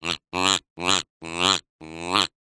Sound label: cartoon duck quack